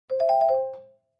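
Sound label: Clavichord Short Major; keyboards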